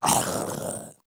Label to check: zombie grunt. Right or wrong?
Right